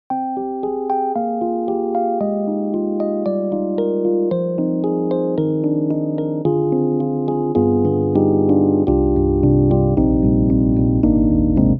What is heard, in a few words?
Rhodes; EDIROL; Super Quartet; Taxi Rhodes